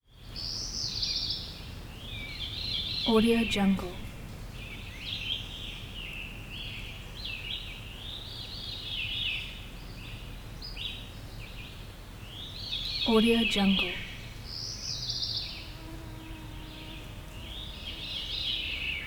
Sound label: birds forest birds exterior spring birds in forest